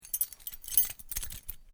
Key Rattling, Move key on key ring. Light metal clanking of key movements; Clang Sound Effects